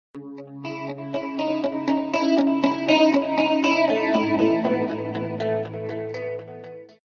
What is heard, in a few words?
New Sms